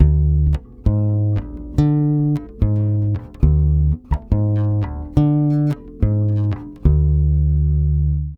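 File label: 140 bass D diminished, brazil bossa; USB Soundscan; AKAI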